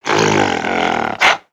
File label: atk idle, creatures organic